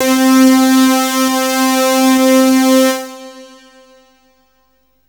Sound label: DIGI SAW; SoundFactory; SMPMANIA